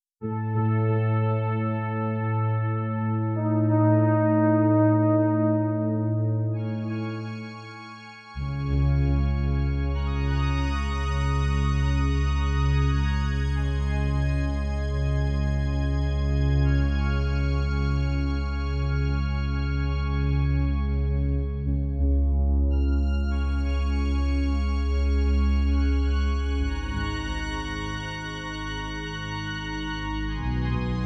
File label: Epic Horns